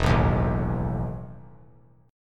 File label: E Major 7th Suspended 4th; strummed; JGuitar